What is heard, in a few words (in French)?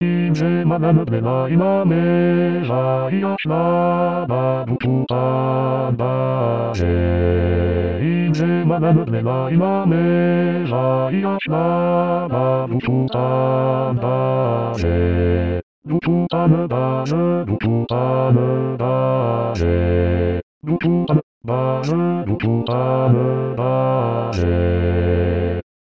Basse